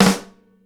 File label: SNARE, Live Snares